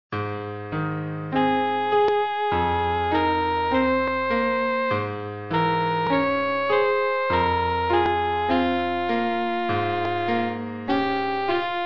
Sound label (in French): saxo alto mib et piano